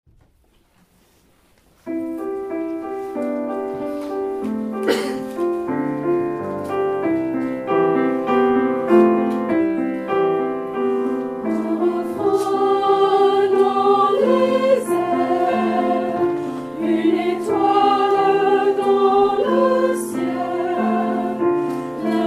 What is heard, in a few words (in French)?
chorale et piano; Noël 2017